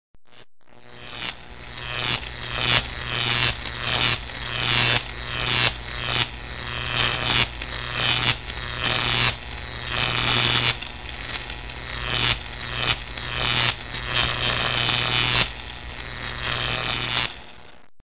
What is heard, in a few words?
high voltage